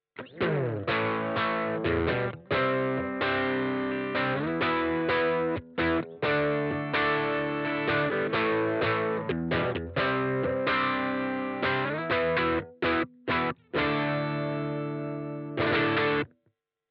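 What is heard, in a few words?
DUAL CUBE Brit Combo dry, guitar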